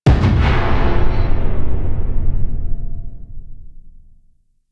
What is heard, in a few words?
Rail Jack Ram Sled Intrusion Far A; Hazards; Warframe